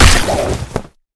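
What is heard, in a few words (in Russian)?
размашистый режущий воздух удар со свистом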